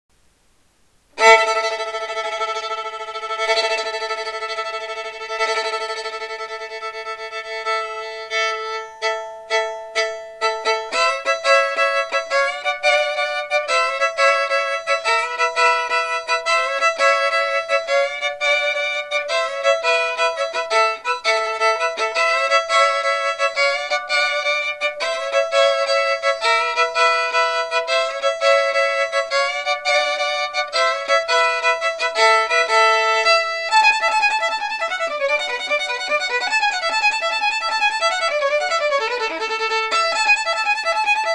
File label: Fiddle; Mr. J violin solo: Just Some "FIDDLING"